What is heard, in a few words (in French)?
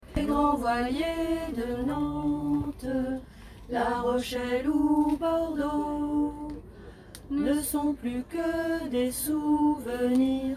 voix haute